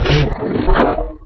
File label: jump; openffa; ogre